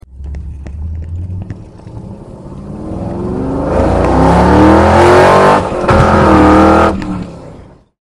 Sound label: Magnaflow 4x9 dumped Jet Hot LT 3in H pipe SS